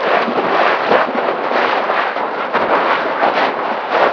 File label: body medium scrape smooth loop; physics; source engine; left 4 dead 2